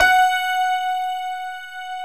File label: GRAND PF F#4, AKAI S-Series